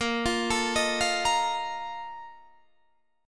A sharp Major 7th Suspended 4th, down, Guitar, Standard; arpeggiated; capo=2